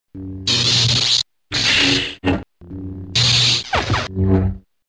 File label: lightsabers